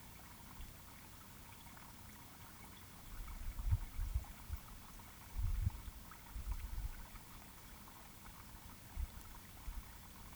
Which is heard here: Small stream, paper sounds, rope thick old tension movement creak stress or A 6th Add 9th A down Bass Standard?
Small stream